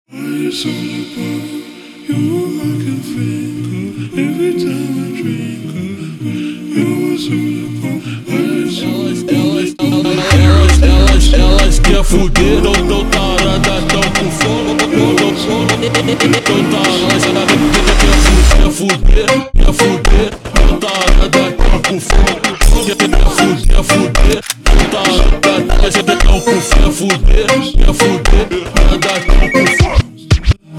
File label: Funk slowed